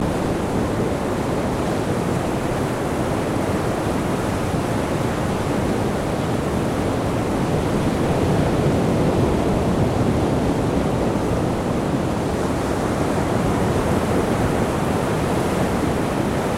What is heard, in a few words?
Ocean